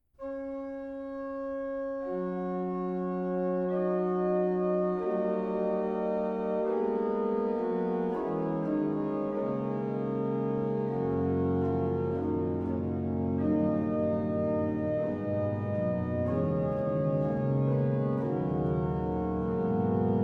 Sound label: Toccata Ottava, organ; Girolamo Frescobaldi; Works for organ